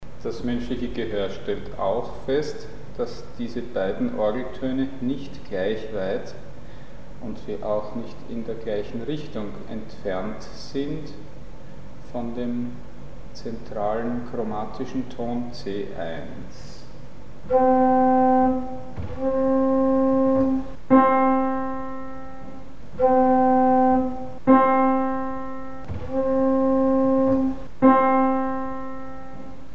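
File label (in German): Unterschiedlich tiefer oder höher als das Zentrum des chromatischen; Mikrobuch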